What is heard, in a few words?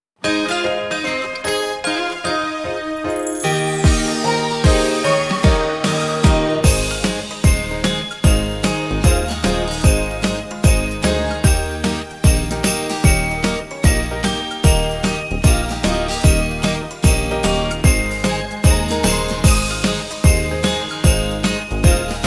Backing Track